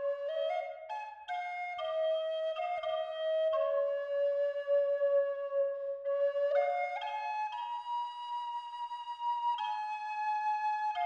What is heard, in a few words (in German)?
Walnuß B mittel 432Hz